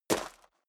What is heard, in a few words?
step high heels gravel medium single left; schritte; Footsteps